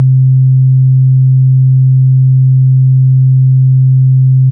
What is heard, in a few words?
Oscillator Sine C2; radiosonics; Vintage Oscillator